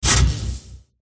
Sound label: mage attack contact bottom